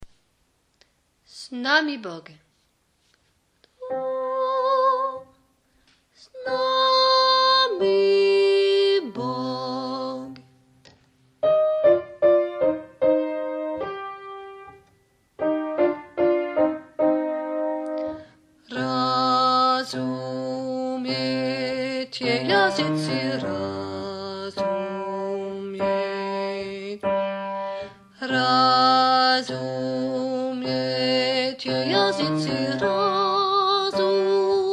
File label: snami bog, basses